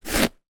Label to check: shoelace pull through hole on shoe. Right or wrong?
Right